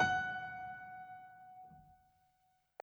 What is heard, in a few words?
Piano Chord